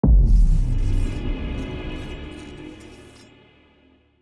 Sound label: theme muted; Stellaris; grand archive